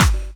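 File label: snare drum bass drum, Dance Mega Drums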